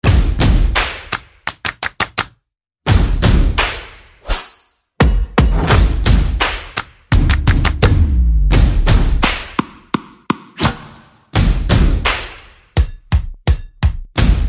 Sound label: Percussion and Effects